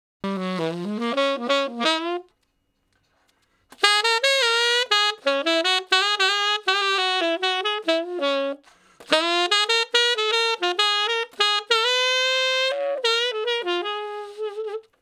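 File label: Sax Slow Blues (Db V, IV, I, V) pickup 2 beat, Tenor Sax; Crimson Blue and Fabulous Horncraft 4 RnB; Horncraft for R&B; Solos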